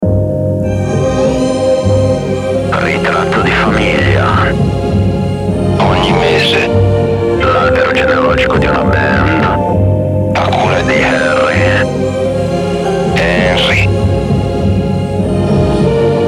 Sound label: Jingle; Ritratto di Famiglia